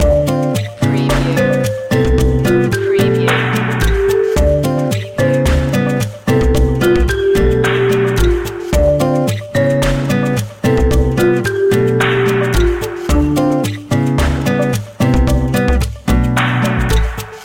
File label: lounge water loop